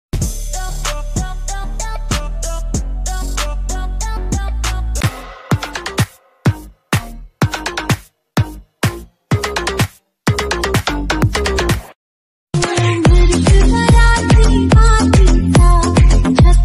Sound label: maratha ringtone; chatrapati shivaji maharaj ringtone